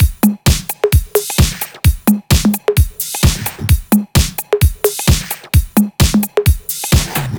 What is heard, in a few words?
130BPM Jig Kit; Vengeance Samples; DK Jig Kit